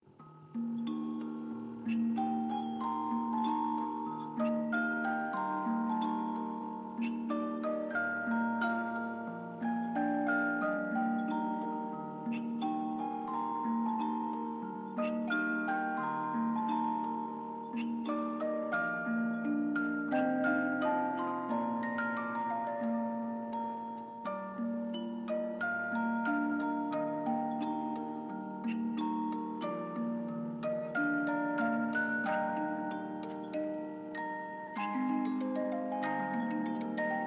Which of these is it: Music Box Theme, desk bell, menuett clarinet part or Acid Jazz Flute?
Music Box Theme